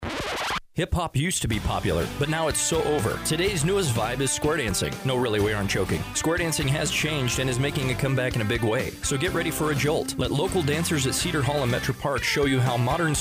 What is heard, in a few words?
Square Dance hip hop